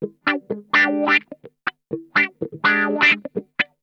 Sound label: WAH, Funk Guitar; Zero G - Funk Guitar